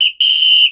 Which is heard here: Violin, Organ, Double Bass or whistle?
whistle